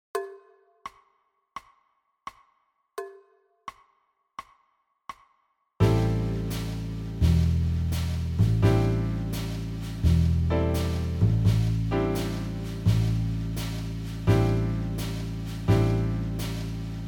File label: Beginners Blues 85